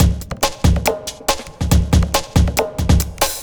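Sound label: industrial 140 kit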